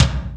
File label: R8 Tom, Roland R-8